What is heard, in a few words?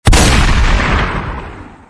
shot; deathrun silenthouse